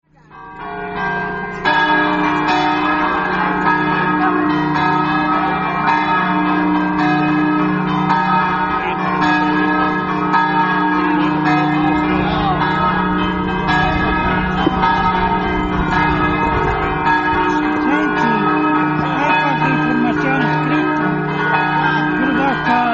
manzano, campane

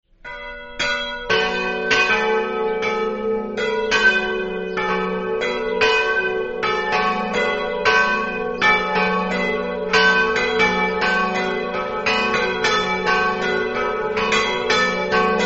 castions, campane

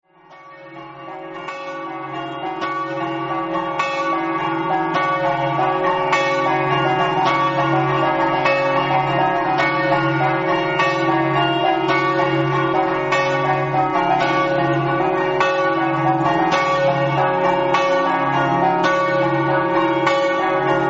camino, campane

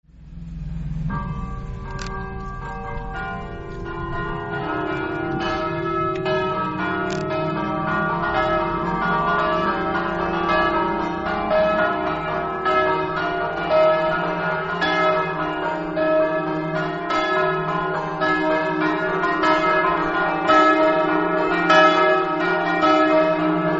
campane; vendoglio

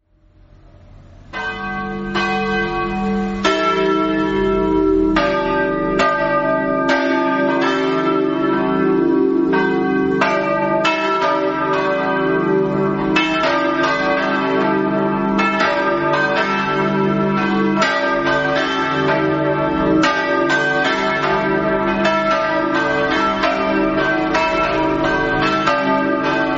sanzuan, campane

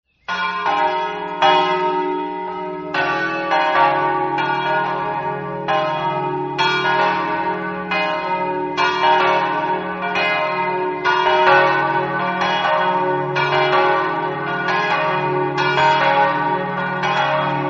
gagliano, campane

